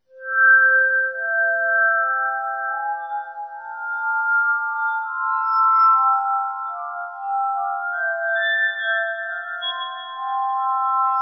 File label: armonica